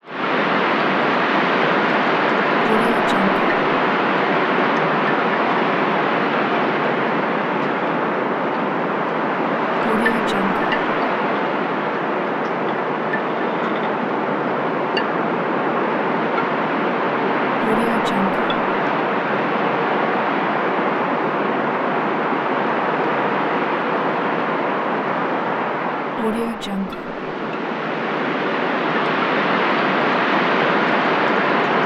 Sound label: windy storm whipping through trees